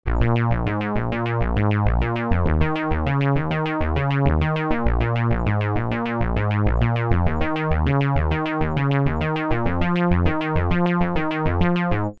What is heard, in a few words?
Matrix 1000 Bass